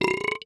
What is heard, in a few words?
Human Male Burp